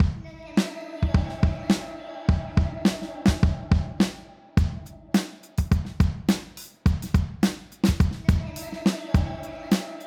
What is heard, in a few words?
DRUM